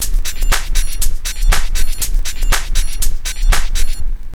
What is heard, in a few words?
house loop